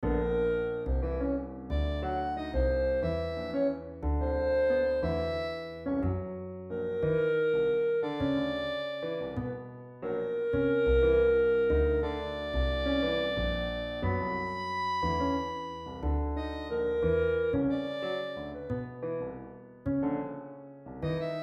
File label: pulse field